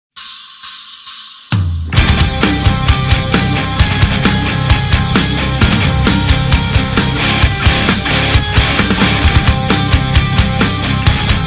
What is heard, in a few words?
intro music; iberia; maus